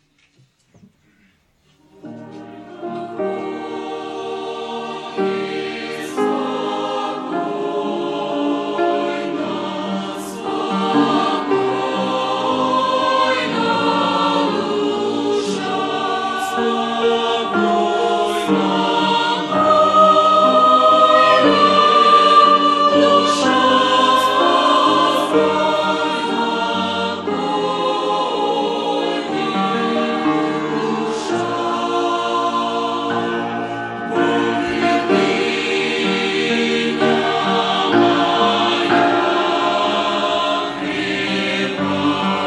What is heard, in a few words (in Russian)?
Пение хора; Хонженково; Молодежное общение